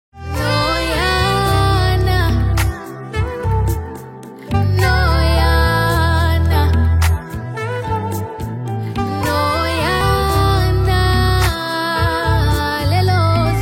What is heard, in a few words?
Intro Sax